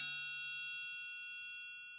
Triangle D Open, Latin Percussion; Drums; Drum Hits; Bell; Ableton; Factory Packs

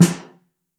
Snare Mono; Ableton Foundation FreePacks; Snare Designer

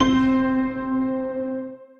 Hyperion Piano, Broken Keys; Multisampled; Instruments; Ableton; Factory Packs; Mood Reel

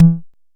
analog pitched, Kickbank; Ableton Foundation FreePacks

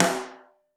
Mono, Snare; Ableton Foundation FreePacks; Snare Designer

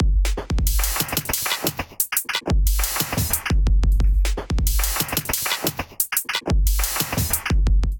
Night Hub Kit, drums; Ableton; Glitch and Wash

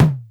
FM, Foundation Drums; Ableton Foundation FreePacks

